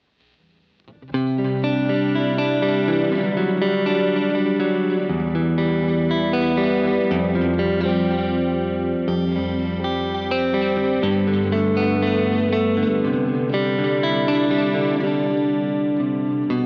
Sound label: mod delay